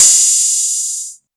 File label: Hat Open, 606; classic machines